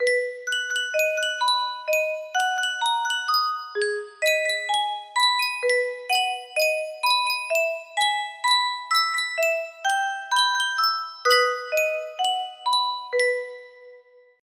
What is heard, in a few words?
Yunsheng music box, music box melody